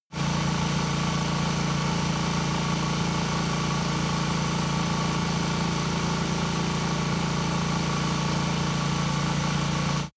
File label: motorcycle engine low constant rpm ascending; motorraeder; verkehr transport